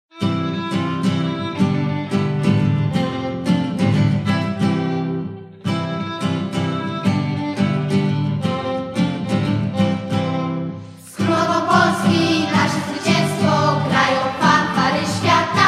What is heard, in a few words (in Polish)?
Fanfary